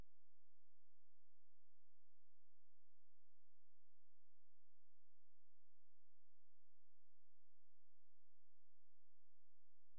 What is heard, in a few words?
brown noise inverse